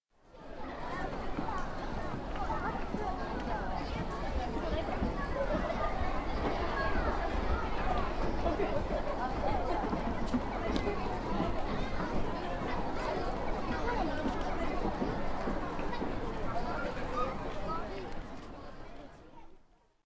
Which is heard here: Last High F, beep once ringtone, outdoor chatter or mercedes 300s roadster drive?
outdoor chatter